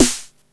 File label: Snare, retro house samples, Drum Hits